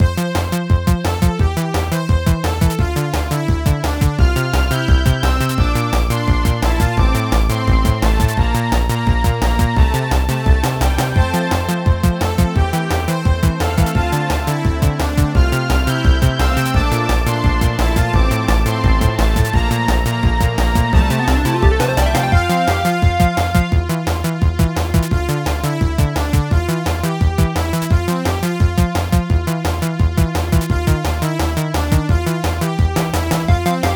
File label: Instrumental; Friday Night Funkin' Lock On OST mod Windows gamerip 2023